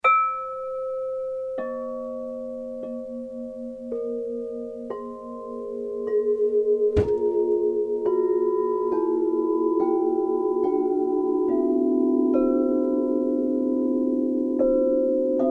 tous bols givres; Singing Bowls; etoilescristallines

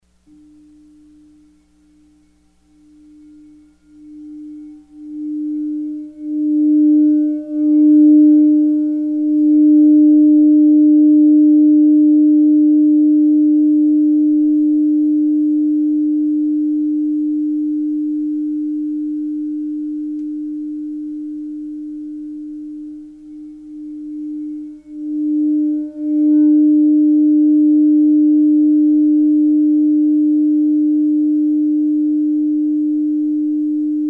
Son Chakra D; Singing Bowls; etoilescristallines

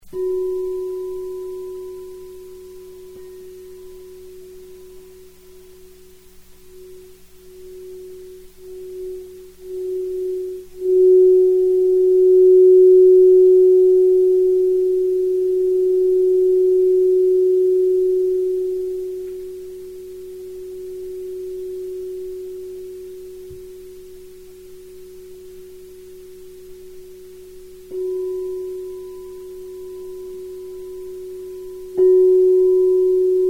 Son Chakra F; Singing Bowls; etoilescristallines

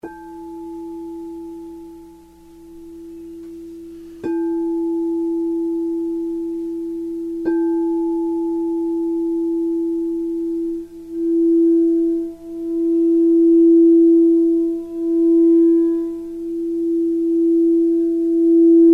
Son Chakra E; Singing Bowls; etoilescristallines

